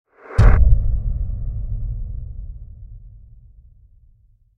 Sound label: Impact Deep - Subsonic; Hollywood Edge; sound designer's toolkit v2